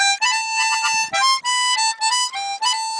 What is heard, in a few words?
Harmonica